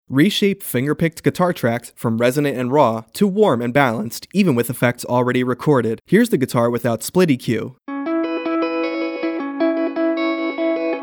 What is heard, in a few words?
Rebalance Guitar; ギター; SplitEQ